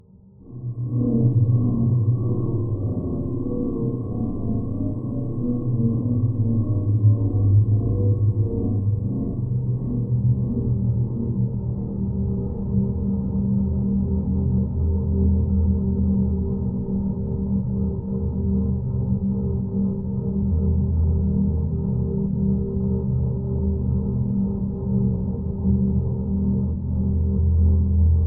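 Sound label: Ambient Music; The Eternal Cylinder ps4 ps5 windows xbox one xbox series xs gamerip 2021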